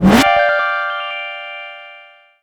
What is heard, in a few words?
Character Attack